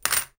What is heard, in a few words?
coin